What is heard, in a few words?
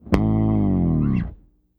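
SHORT FALL A1, BASS, EFX FALLS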